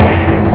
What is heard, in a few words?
sdrum; ST-XX Amiga Soundtracker Sample Packs